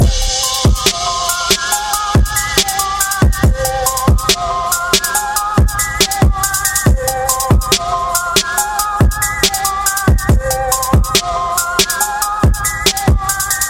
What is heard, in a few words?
Sick Hip Hop Beat